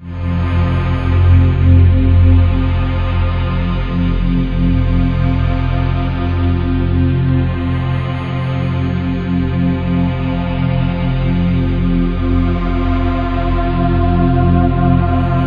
GHOSTIC, SYNTH SND, Ominous Drones; USB Soundscan vol.48; AKAI

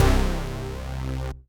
Synth Prophet Bass C; Electronisounds

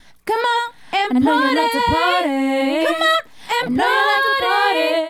Female Group, Hip-Hop and RnB Vocals

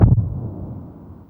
PUFFTER, Impacts, Hits & Booms; Distored Reality

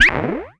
NOISE

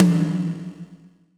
TOM, percussion reverb; MARKYDRUMS

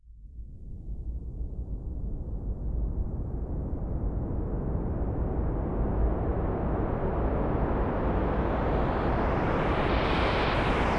AIR WAVE; AKAI Anime Sound FX; AMBIENT

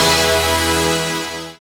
SYN, dance; Sound & Vision - Gigapack II

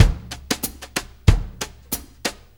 Country, Drum Loops

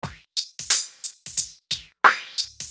Filter Groove

